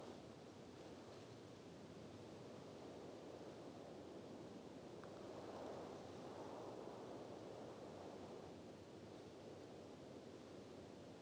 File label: ambience beach breeze; Rust